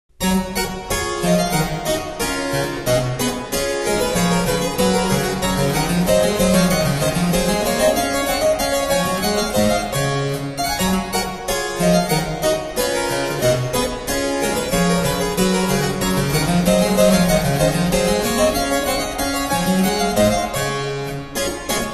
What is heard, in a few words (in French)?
gavotte en sol majeur; bach